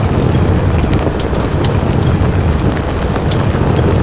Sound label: obj slide; mission sound